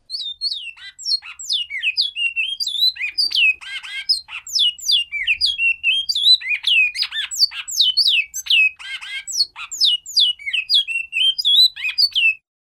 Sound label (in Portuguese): canto bicudo